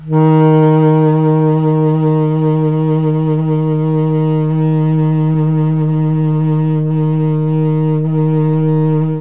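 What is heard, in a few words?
D#2 Tenor Sax, Mellotron; CHMB TNR SAX; Mike Pinder - Mellotron Archive CD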